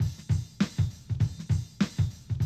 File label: drum loop, 100 BPM; Best Service; XXL 1500 DrumLoops